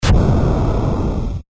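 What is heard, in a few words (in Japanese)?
炎魔法 中, 魔法効果音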